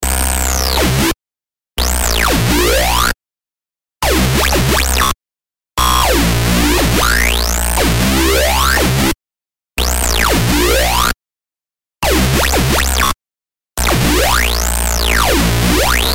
dubstep drum n bass bass with redux

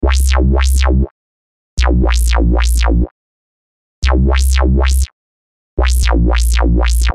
dubstep drum n bass bass with lfo

